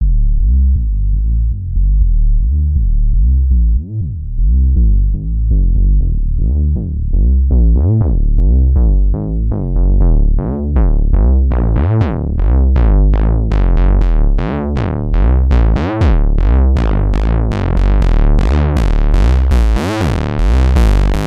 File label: ensoniq sq-80 sounds, pwm lead